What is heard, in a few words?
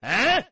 eehh